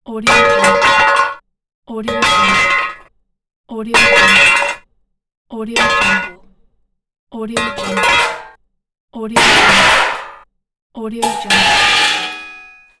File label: metal falling clang